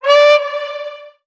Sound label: ooh, Voice